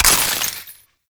Ammo Pickup Shock; Ammo Ability; Warframe; Warframes; Frumentarius

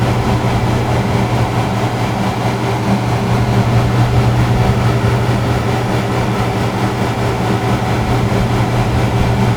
Machinery Air Loop; Props; Warframe; Tau; Ambience

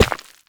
Operator Run Rock, Footsteps; Warframe

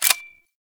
Glass Shotgun Fire Stop C; Warframe; Tenno

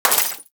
Bullet Impact Debris Glass; Warframe; Weapons; Bullet Impacts

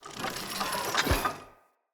Face Close; Encounters; Ode Pollution Tower

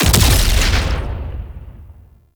Turret Projectile Explosion; Duviri

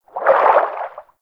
Personal Quarters Fish Tank Movement Quick; Warframe; Hub; Liset Personal Quarters

